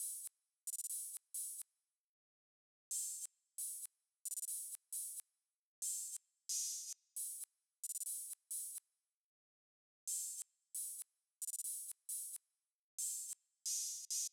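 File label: 134, Open Hat Texture, B minor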